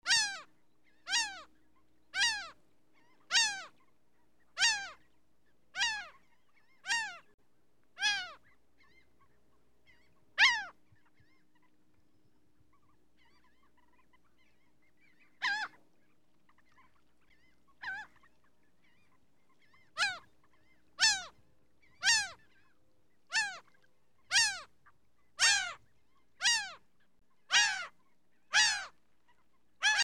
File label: atmo birds laughing gull